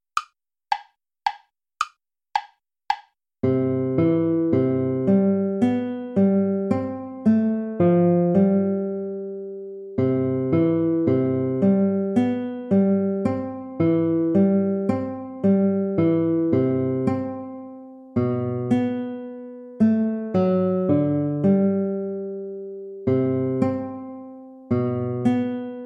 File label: tempo minuetto